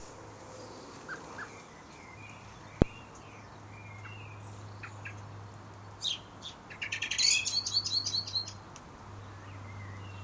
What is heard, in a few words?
birds singing in our back garden in maidenhead